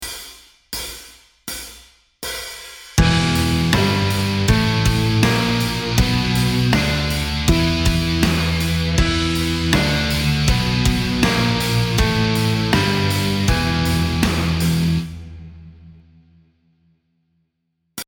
Exotic Modes E Blues Minor Pentatonic b5 Relative G Major; metal music theory